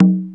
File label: 727 PAD DRUM, TR-727; Hollywood Edge; The Big Bad Beatmachine